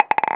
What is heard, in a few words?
pocket, Billiards